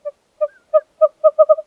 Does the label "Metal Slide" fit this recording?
No